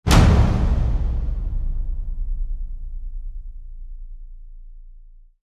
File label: Epic Slam; Gothic Storm Music